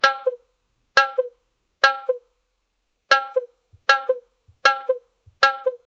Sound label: cockoo bird followed by s